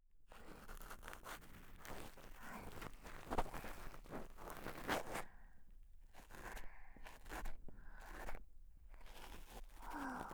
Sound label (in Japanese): 右耳 吐息 綿棒耳かき ループ音源; 和み処 一番星～距離感の近い甘えん坊な仲居『月さん』が一生懸命ご奉仕する癒しの 一日～; 月の吐息&寝息 ループ音源